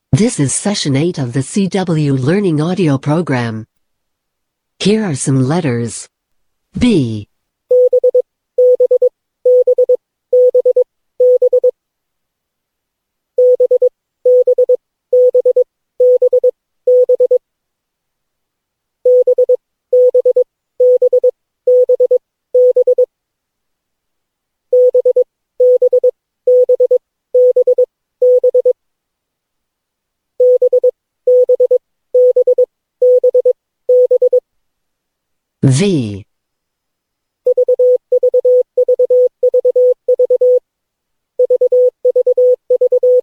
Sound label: cw training